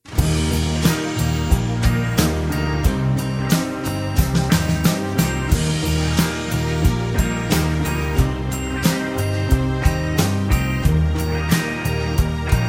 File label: Eb No Backing Vocals, backing tracks